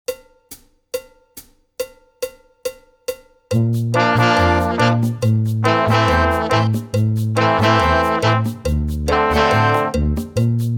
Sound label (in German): ohne Drums